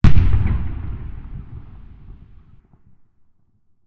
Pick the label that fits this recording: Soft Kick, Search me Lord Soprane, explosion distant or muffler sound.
explosion distant